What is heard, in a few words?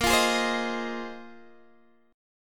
A sharp 7th Suspended 2nd Suspended 4th, Guitar, DADGAD; x,13,15,13,15,13; down